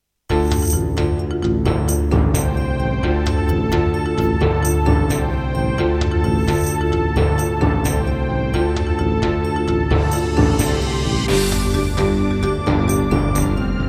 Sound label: Roland SC-8820; Heretic